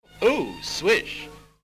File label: ooo swish